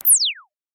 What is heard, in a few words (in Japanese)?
プチュン トランジション